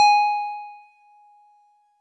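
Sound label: Wood Piano DX100 G#1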